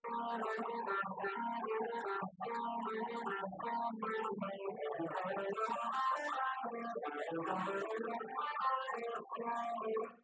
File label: nodrums; SiSEC10; underdetermined